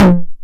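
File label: 909 high tom tape saturation; Goldbaby Tape808 & Tape909; The Tape 909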